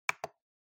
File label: Trackpad Click L